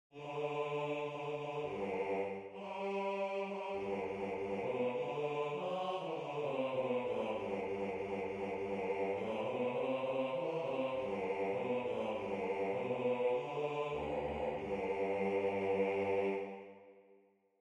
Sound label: Bass